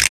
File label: chip clap